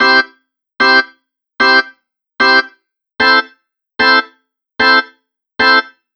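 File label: HAMMOND loop; ORGANS; Ragga & Dub Reggae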